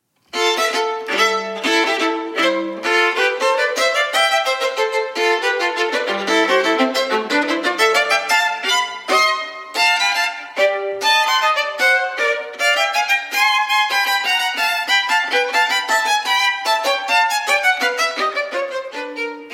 theme with 8 variations for solo violin variation vii scherzando